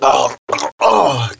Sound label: gargle; SiN; blade